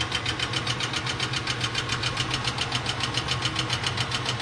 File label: bad gasket; Sound FX Real Life